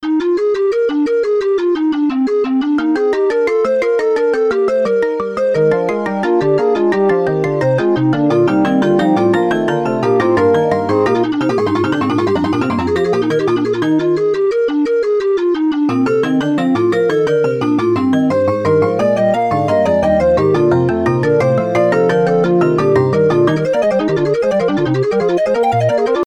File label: fugue